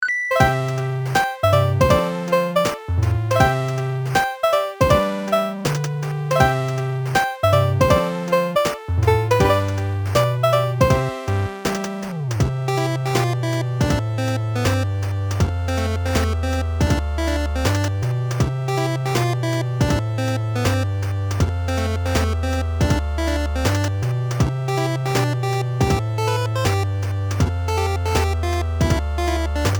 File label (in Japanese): 8bit Chiptune ver Loop ～レトロかわいい作業用配信用 Kawaii～; にゃるぱかBGM工房